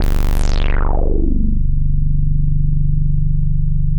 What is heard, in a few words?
G1, Bass; E-MU Producer Series Vol. 6 – Analogia Project (CD 1); Boom da Bass 2